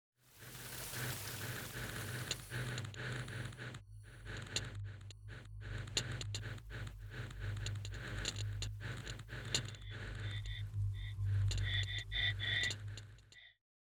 time clicks fire

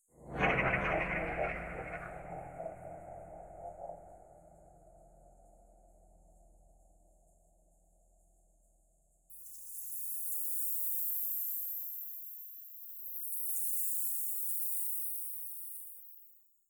crunch strata

